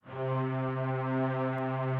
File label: Instrument